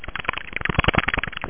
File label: SLIME